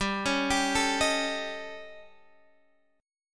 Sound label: A 7th Flat 5th G